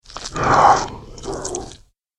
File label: Flesh Eating Monster; Andrew Fleming